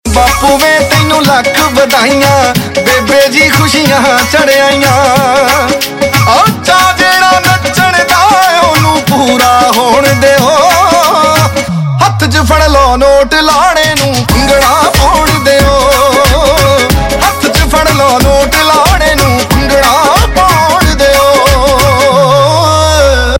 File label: Bhangra Ringtone, Punjabi